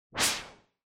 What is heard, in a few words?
Noise Sweep Short